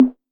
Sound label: TR808 Tom, Drumset; Roland SH-32; Drumset 03